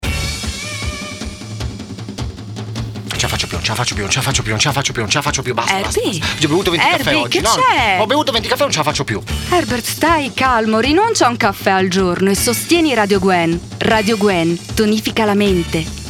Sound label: Jingle